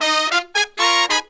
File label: Phantom Horns, 132BPM; 90 s Sample CDs; Zero-G